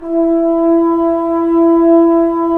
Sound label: BRS Tbns; Roland - LA Composer vol. 4